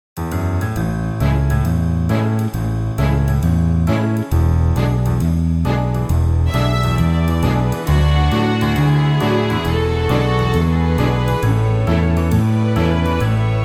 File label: instrumental